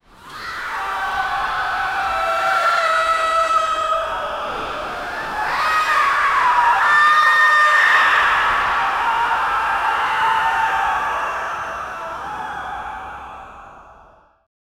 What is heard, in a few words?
screams of people in hell; anguish